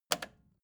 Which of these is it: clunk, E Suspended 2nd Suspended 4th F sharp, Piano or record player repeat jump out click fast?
record player repeat jump out click fast